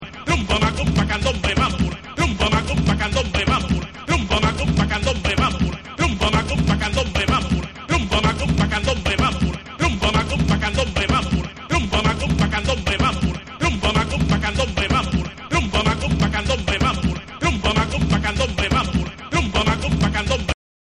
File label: RHUMBA MACUMBA Acappella